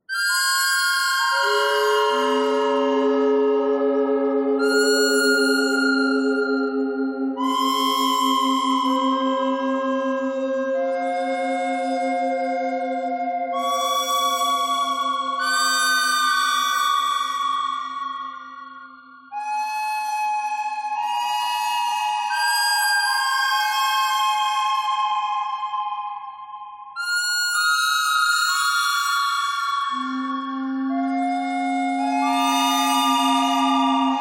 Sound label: bird thread harmonic; noreverb